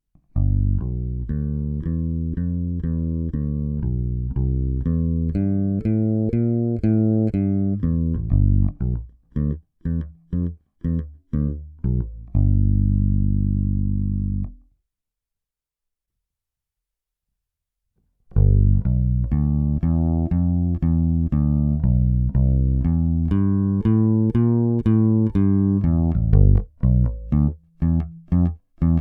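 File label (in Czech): Bezpražcová baskytara Cort B4FL - čistý zvuk (snímače Bartolini MK1 (reverzní single/split-coil), hlazené struny D'Addario Chromes, staré asi 4 roky, ale málo hrané); NUX NPB-5 Melvin Lee Davis Bass Preamp & D.I. Box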